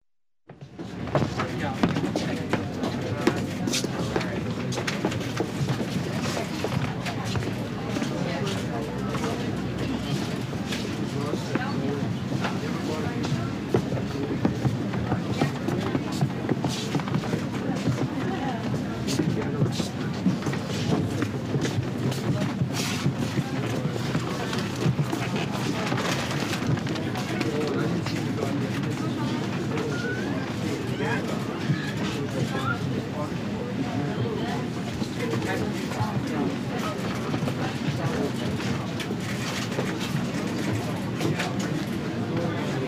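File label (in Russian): магазин универсам общая атмосфера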